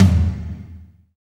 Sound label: TOM TOM, Roland